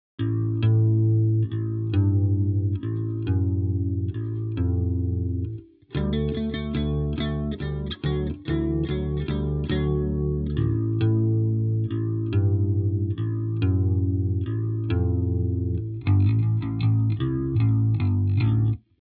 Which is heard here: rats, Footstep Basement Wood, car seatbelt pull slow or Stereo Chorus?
Stereo Chorus